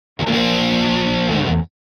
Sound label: Guitar Heavy Metal Finale Chord; Hollywood Edge; sound designer's toolkit v2